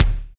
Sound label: BD, BD Dance; Sound & Vision; Gigapack I CD 1